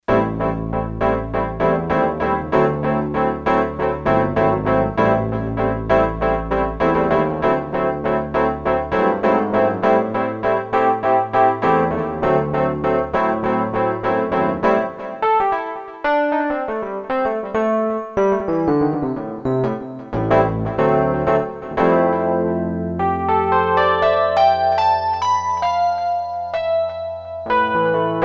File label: E. Piano, Electric Piano, Korg DSS-1